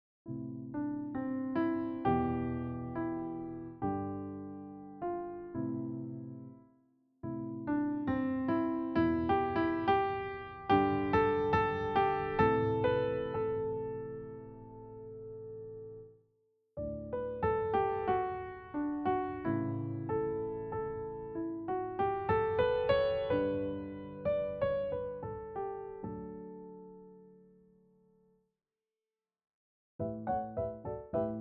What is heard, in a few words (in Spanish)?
Posiciones de los acordes